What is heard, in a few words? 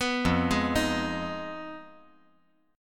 F Minor 7th Flat 5th, chord